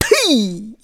Grab Fish; Oren grabbing and swallowing a fish; zelda

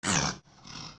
young snort; Young Epona snorting; OOT

